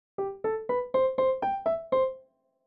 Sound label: reverb